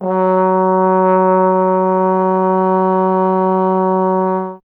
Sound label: Trombone F#; Miroslav Vitous - Solo Instruments VOL-1